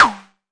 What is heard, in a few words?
mbrute sn, Arturia MiniBrute